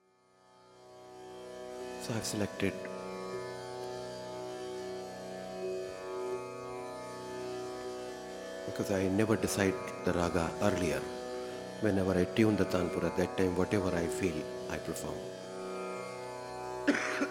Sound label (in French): Chant Dhrupad Raga Desh (Parlée)